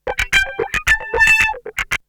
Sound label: Guitar; ZERO G - Datafile DISC 3